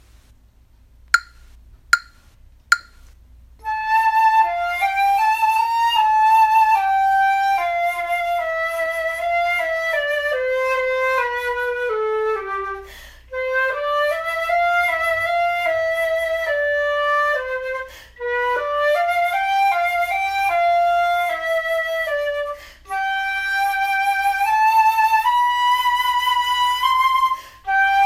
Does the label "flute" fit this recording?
Yes